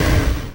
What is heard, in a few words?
Perc Hit, drums; Drums & Drones